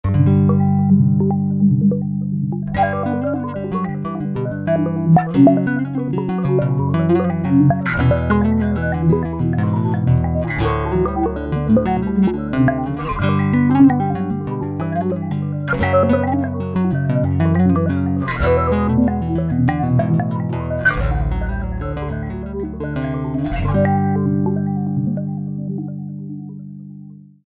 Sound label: Two Handed Filter